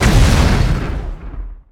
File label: Explo Grenade; Destruction